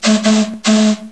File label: SHIP; 交通; 船; 特酷声音素材库